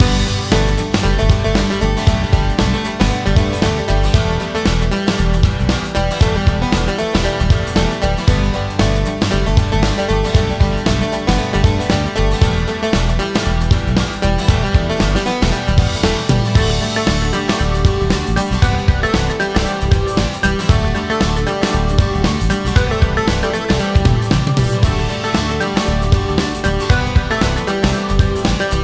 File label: Upbeat sim Loop